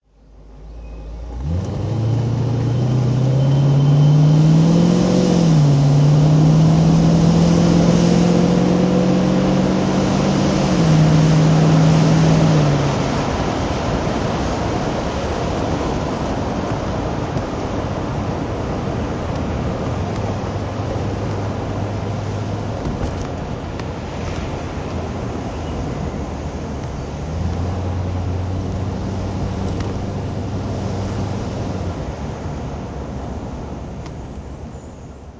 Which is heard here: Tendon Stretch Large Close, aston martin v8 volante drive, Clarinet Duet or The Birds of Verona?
aston martin v8 volante drive